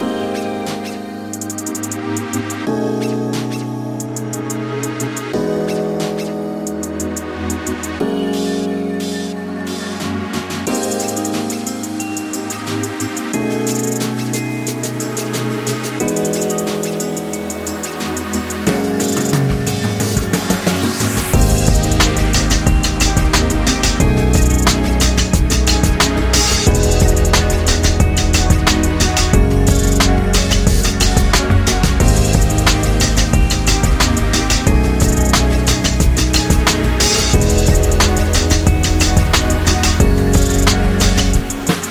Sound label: Sexy RB